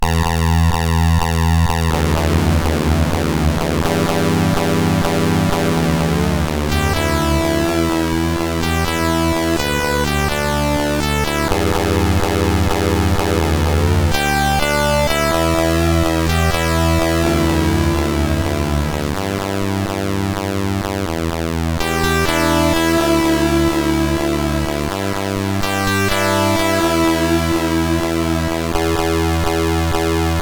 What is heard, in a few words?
Sound sid CSG8580R5; Super Sound 5